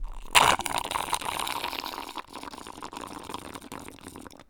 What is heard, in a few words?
Slurping